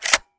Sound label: gl insert; gmod; arccw uc commons attachments